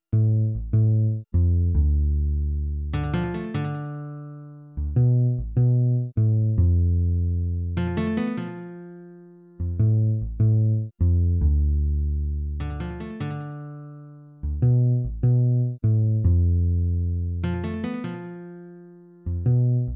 Bass and Guitar Session; The Legend of Zelda Majora's Mask

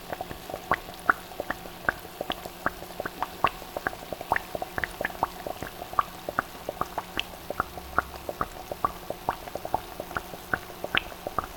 boiling bubbling water gurgle

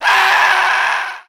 Male Death; Lucius III; pc linux

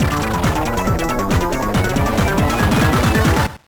Jingle - Speed Up, Level Up

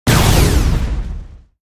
Plasma Grenade

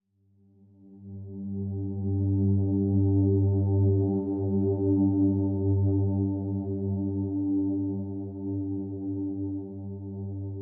Swell Tone; HDD Theme Scull Swell Tone; inside

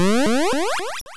Fly Up; sfx; attack moves; pokemon; gen 2; gsc

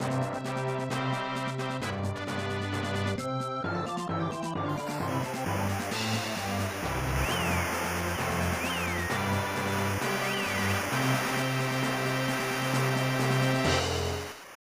Congratulations!

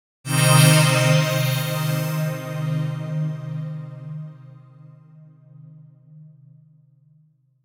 Bass

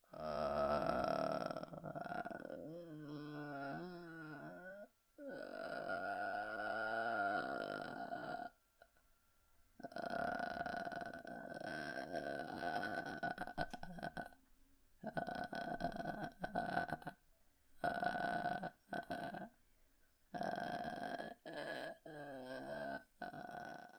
Grandma Basements Sounds

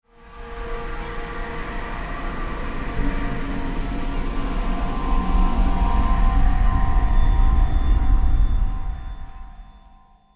Stinger Decrease Action

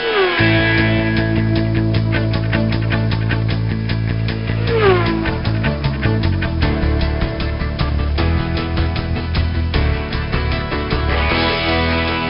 Instrumental; Roary the Racing Car; NDS

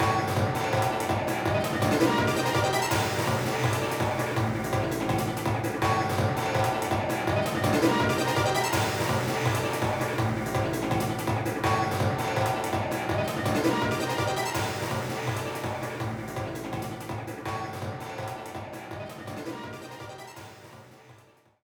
BGM Mission Stage Boss; Leak

